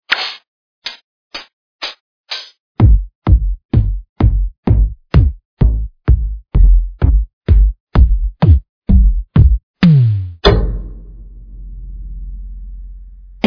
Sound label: layered drums; Fat Layered Drums continued; G-Stomper; 50 best quality Samples (24bit, 44.1kHz, Mono and Stereo)